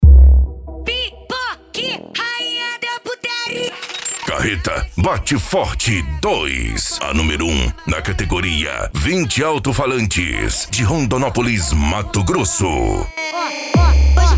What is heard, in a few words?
midback e funk mega bass